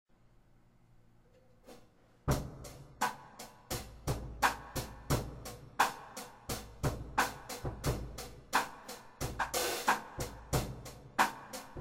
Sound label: Mellow Rock Style